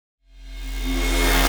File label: Movements; 99Sounds; The Weird Side Samples